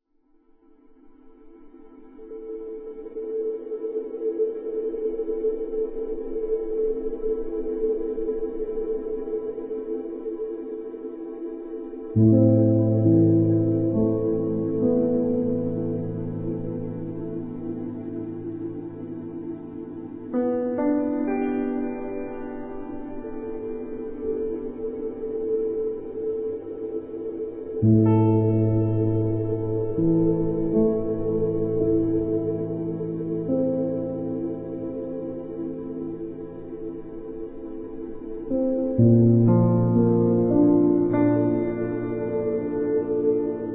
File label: piano textures